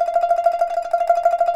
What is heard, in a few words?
PIPA TRL F4; INIS; Opium